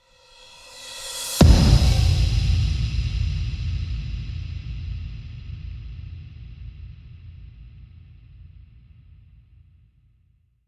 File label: 128BPM, House Electro Fills; Vengeance Samples